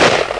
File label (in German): SCHUSS; hunters